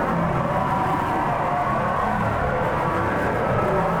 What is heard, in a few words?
Tonal Re Draw, sparse soundscape